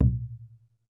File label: PUEBLO, American Natives, Earth Tones